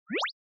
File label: Anime Motion Single